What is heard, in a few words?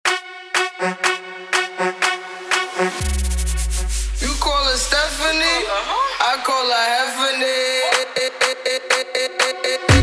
Tribal Party